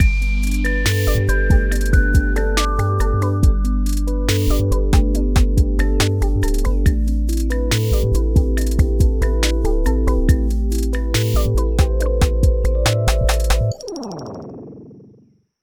Lofi Music End Stinger; Kawaii Love Lofi Music Pack 2

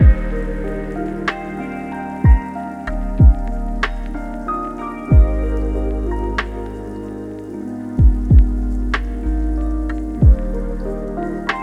Kawaii Love Lofi Music; Kawaii Love Lofi Music Pack 2

